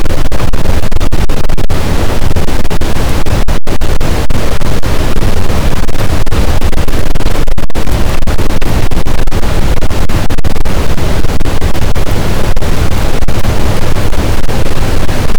cachalot positif, boat, Physeter Macrocephalus

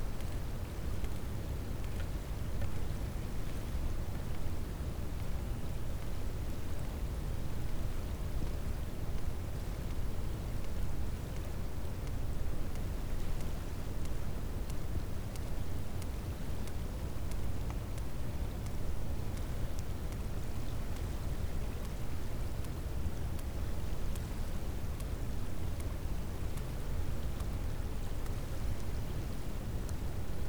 cachalot positif, Physeter Macrocephalus

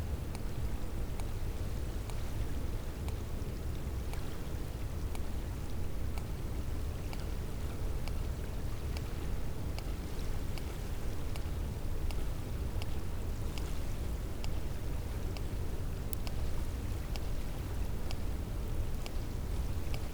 cachalot positif, Physeter Macrocephalus

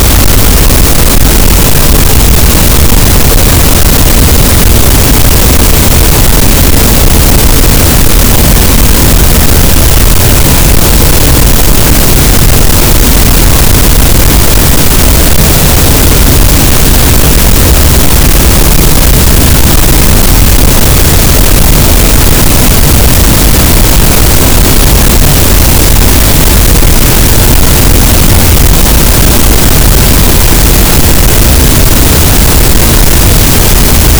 cachalot, gaussian noise; Physeter Macrocephalus

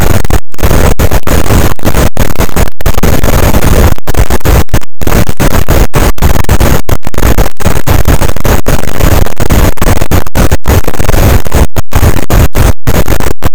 cachalot negatif, boat, Physeter Macrocephalus

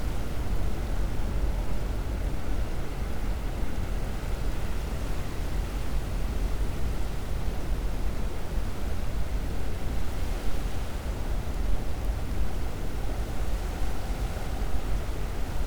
cachalot negatif, Physeter Macrocephalus

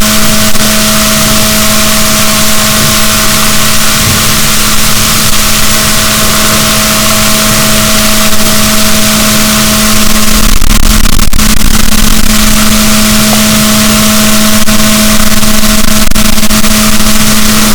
rorquals, boat, Balaenoptera Physalus; SNR 6dB

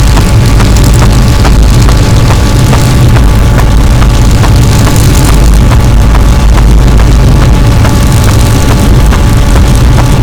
cachalot, boat; Physeter Macrocephalus

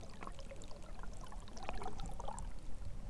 deplacement eau, bruits surface

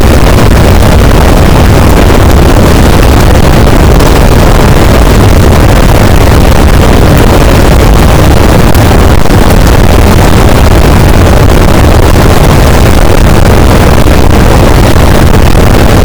cachalot positif, boat; Physeter Macrocephalus

